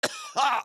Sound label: AHurt